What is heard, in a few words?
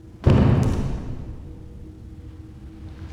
Heavy Thud